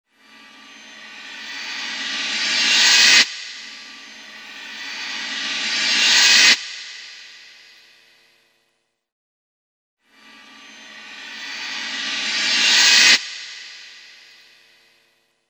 Rev Crash, Cymbals; Industrial

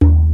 drum; Rubber Chicken Software Sample Bank; DRUMS + PERC; EMU

